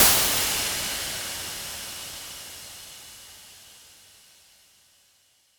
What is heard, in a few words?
Impact